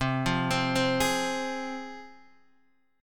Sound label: C Suspended 4th Sharp 5th, C, down, Guitar, DADGAD